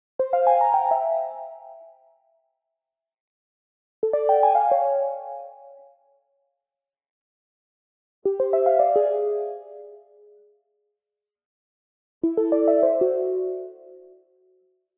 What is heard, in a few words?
Arcade Jingle Negative; boom library; The Interface